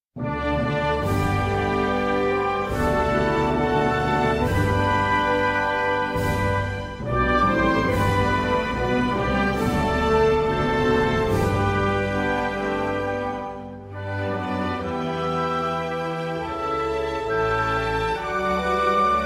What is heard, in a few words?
anthem